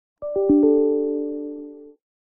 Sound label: Sonido Nuevo Email Sound New Email